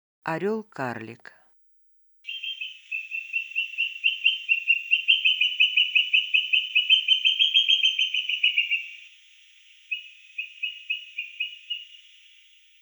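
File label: orel karlik golos, booted eagle voice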